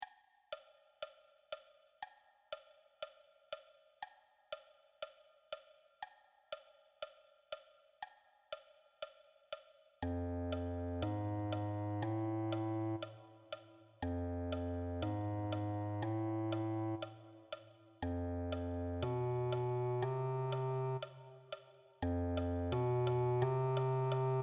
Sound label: Bass Clarinet